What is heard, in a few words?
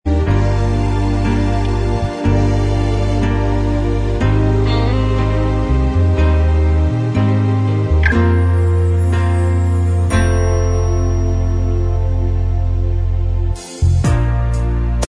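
cover instrumental track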